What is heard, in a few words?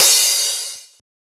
Crash